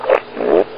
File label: just another fart